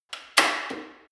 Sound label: gym bicycle saddle adjust lock hard; sport freizeit; fitnessstudio